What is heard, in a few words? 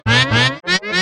hurt